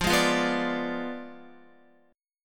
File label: F Diminished 7th, Guitar; strummed; 4F,4A,5C,5G,5C,6E; x,8,8,10,11,10; JGuitar